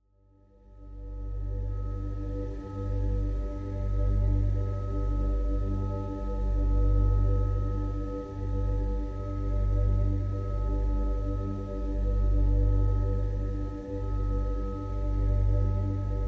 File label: Bass Parts Only